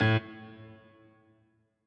piano riff intro

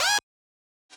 wobble

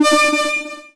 heal; 东方Project; th16

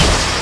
Snare; 悠哉日常大王

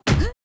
Kick; 音MAD; 街角魔族

